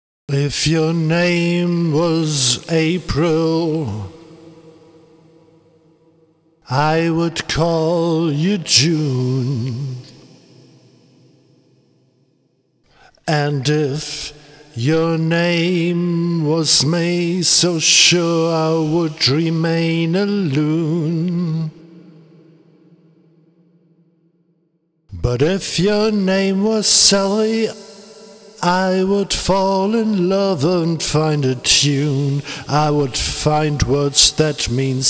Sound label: blues testgesang